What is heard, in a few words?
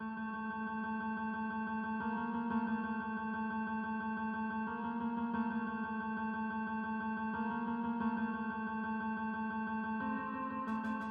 Rhythm and Melody Elements 90 bpm melody